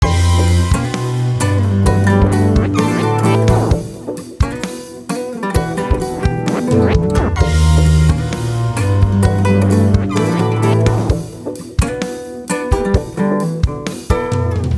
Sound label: Ring